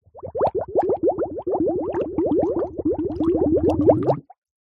foley bottle plastic small empty submerge deep water bubbles; zapsplat